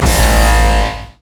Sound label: death ray; LoL; viktor skin03